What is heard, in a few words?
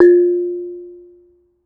Bonang, Gamelan